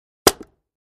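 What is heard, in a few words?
2x4 Smashed Sledge Hammer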